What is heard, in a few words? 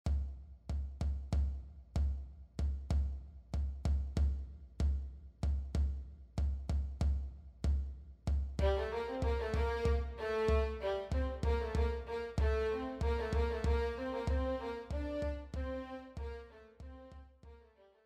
Estampie Natalis SATB